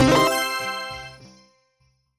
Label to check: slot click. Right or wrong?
Right